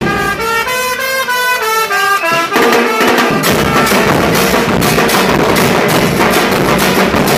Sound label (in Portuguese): bateria de carnaval